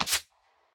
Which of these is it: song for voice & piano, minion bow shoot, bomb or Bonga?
minion bow shoot